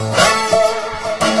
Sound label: dobro danger; music; Left 4 Dead 2; Source Engine; zombat